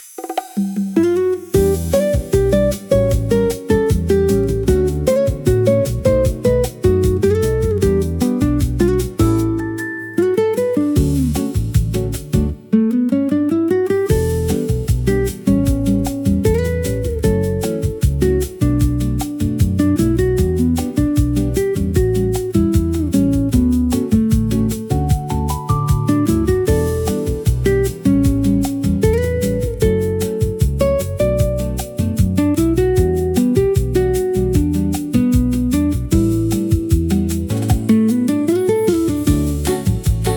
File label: bossa nova acoustic for bright afternoon